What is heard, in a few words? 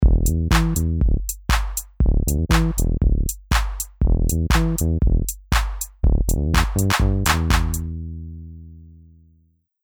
Bass; Ultra Analog Session